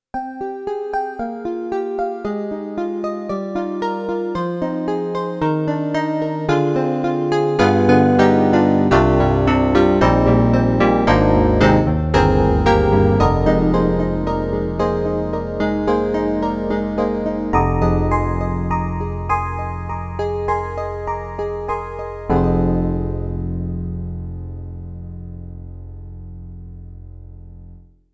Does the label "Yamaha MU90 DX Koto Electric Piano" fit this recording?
Yes